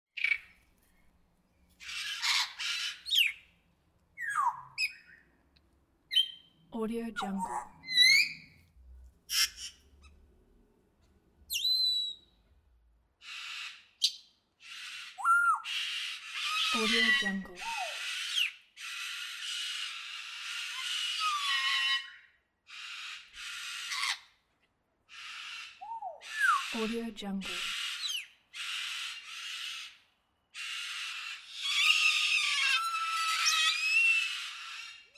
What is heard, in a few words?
cockatoo parrot call and screech; tibasfx